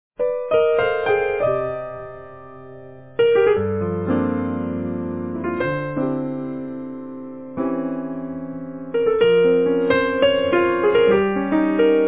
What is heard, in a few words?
8 pianos